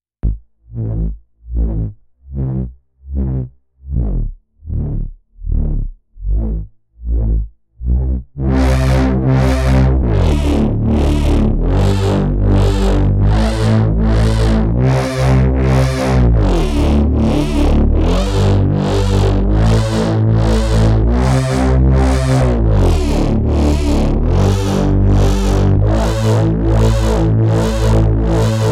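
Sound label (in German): DSIP12M böse Phase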